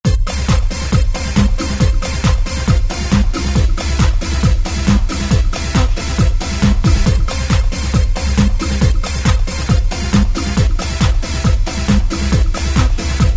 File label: Epic Trance, Korg ES-1; Factory Patterns